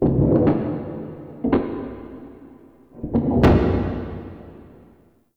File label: Freaky Taps; Warframe; Shadowgrapher